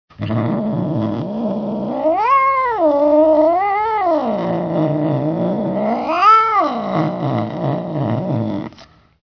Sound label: Warning groan, cat